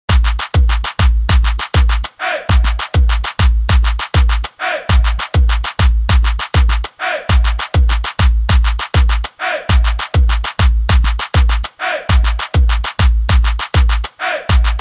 Trumpets Intro Outro Refix

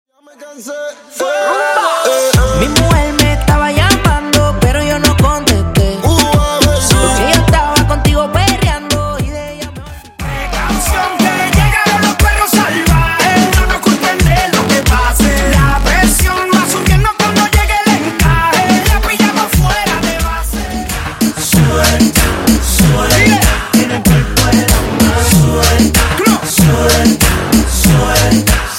Party Reggaeton; 10 Edits Packs V4

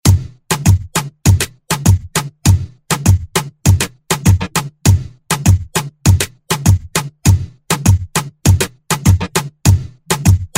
Moombahton Redrum

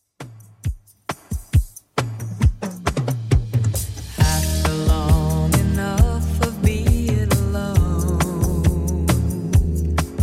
70s Redrum 135bpm CLEAN

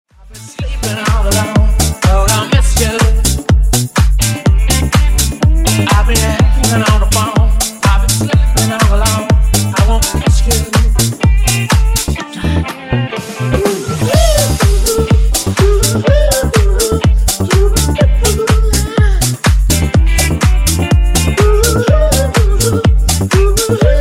Disko Funk Remix 124Bpm

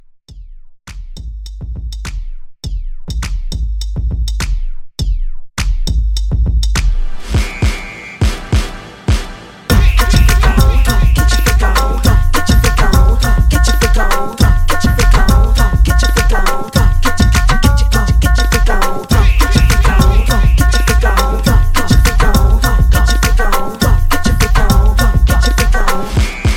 Quick Party Break

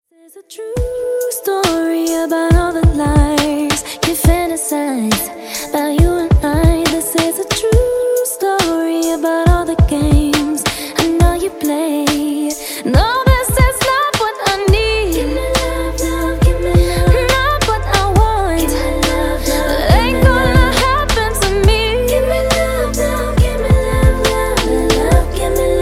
Funk 69 Bpm

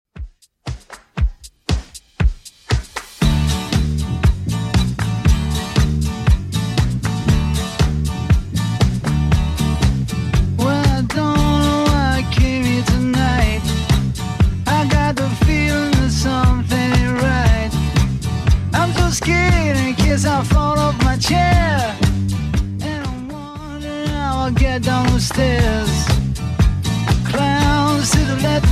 70s Redrum 118bpm

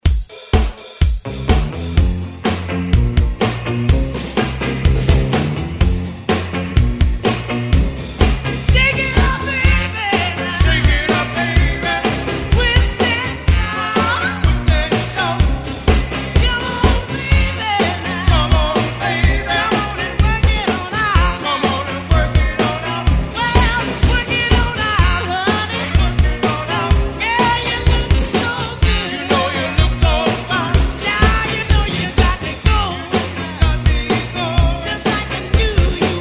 60s ReDrum 125 Bpm; DJDOLLS

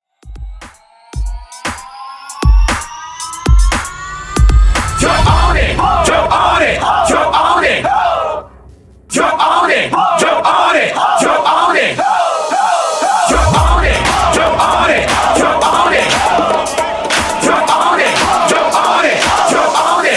Epic Intro

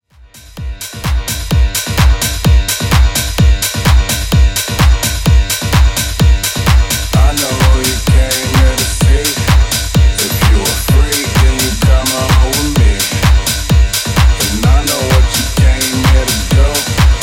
Bootlegz 128BPM; SNIPPET

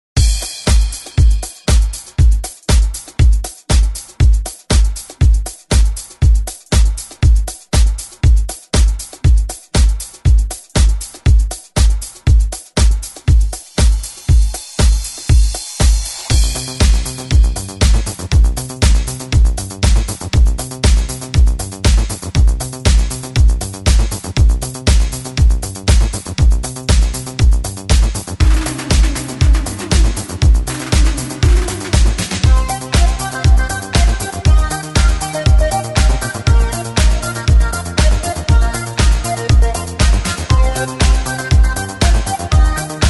80s ReDrum; DJ Mhark

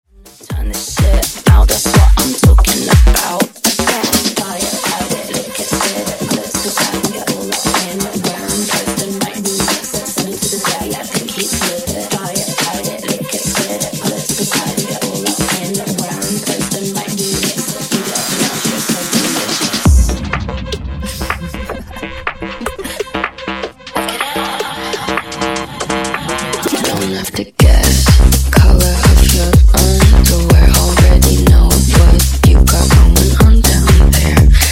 Funk Bootleg 124Bpm

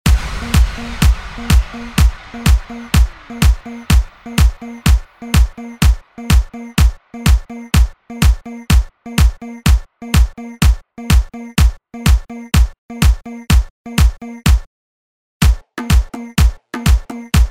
Latin Touch Re Drum

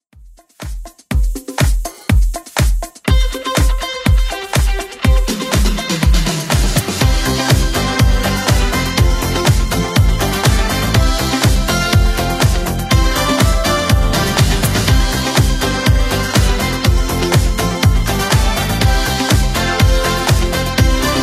80s Club Edit 122bpm

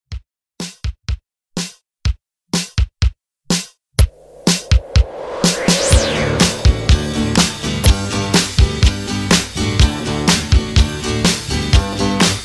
60s Pop Re Drum 124

